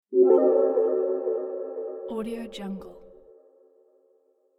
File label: magic fairy staff